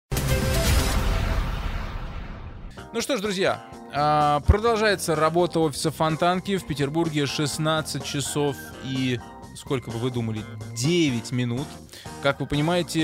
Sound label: Swing